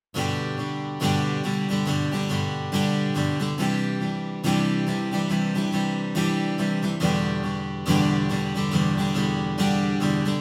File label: guitar